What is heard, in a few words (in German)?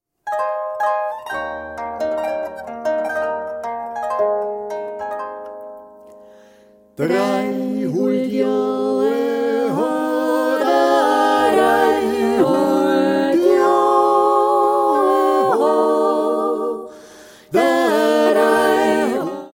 jodler